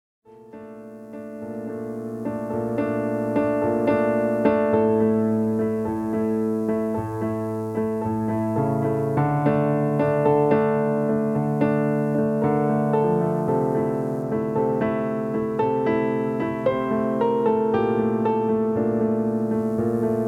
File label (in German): Piano Impression; Berliner Runde; Smük TV